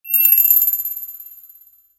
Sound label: a jingly upbeat sound of, coins